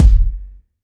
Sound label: warm steel kick drum g key